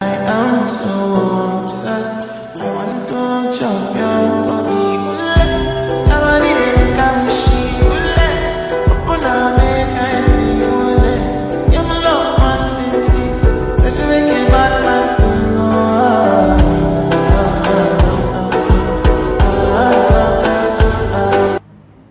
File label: lofi english ringtone